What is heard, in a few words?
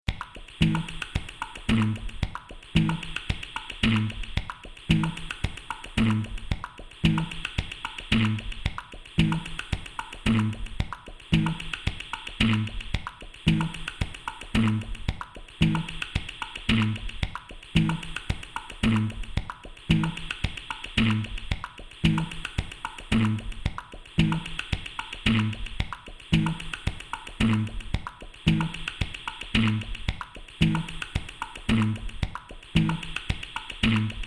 Head Kit Groove